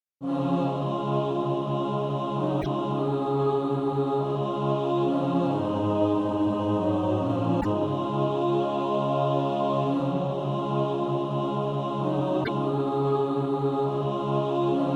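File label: mixed, SATB Practise Music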